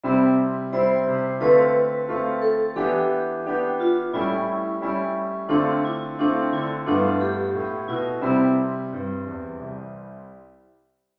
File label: melody